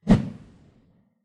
Whip Single Loud Slower

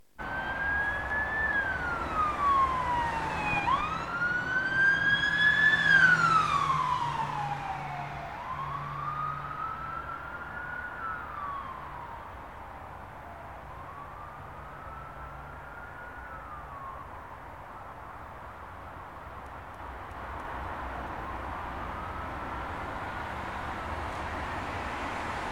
Police Car

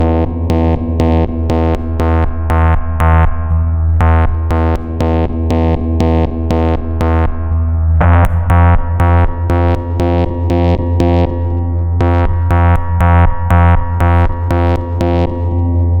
Drive bass

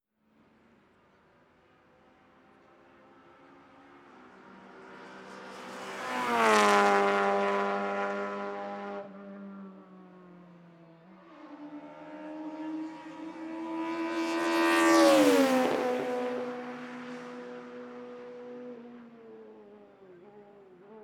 Passing Cars

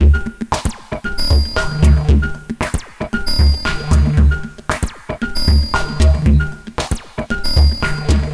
115 Bpm Stab Loop

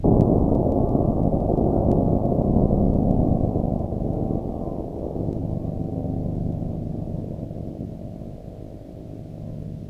Drone

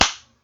Slap

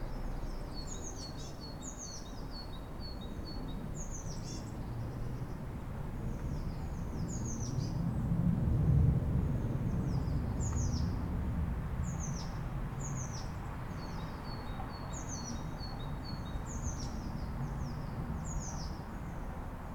Suburban House Ambience

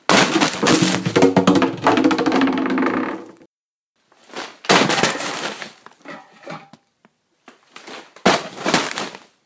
Throwing A Bag Of Hay At My Recycling Bin

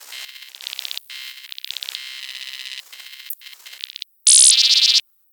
Computer Noises

